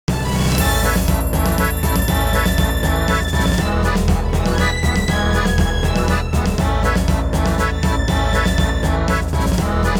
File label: RPG castle town; City